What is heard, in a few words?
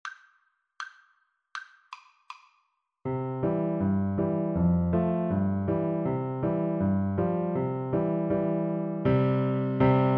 Bingo, Piano Duet